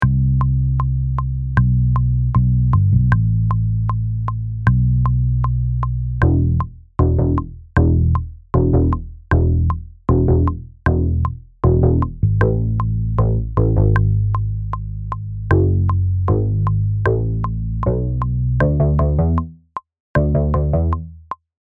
Bass

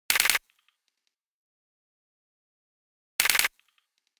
Perc

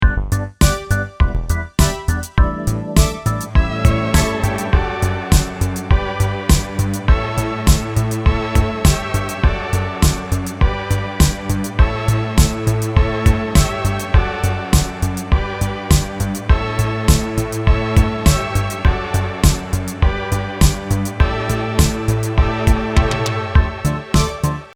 All Lost Synth

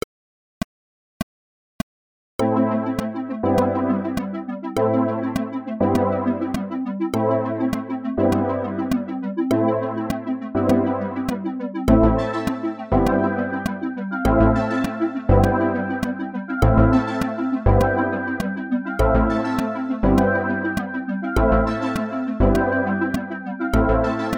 sub melo

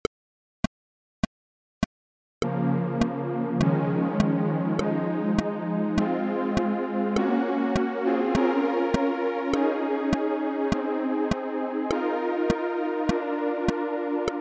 Strings mp

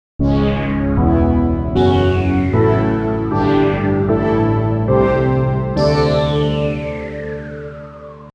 intro All

